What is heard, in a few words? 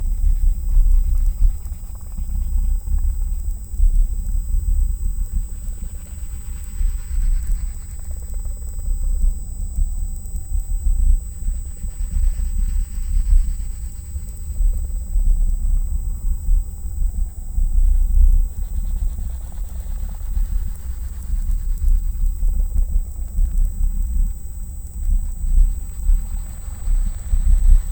Hydrophone